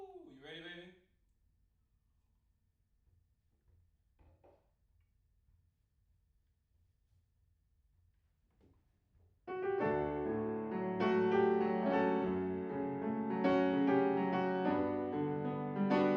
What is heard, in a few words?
Piano Room